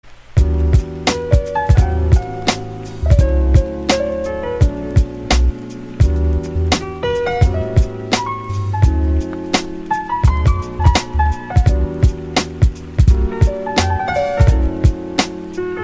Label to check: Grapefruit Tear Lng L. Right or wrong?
Wrong